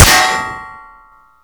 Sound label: Projectile Sounds; Red Orchestra Ostfront 41-45